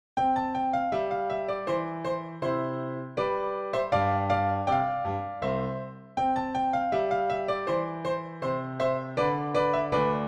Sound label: Dance PianoTeq Bechstein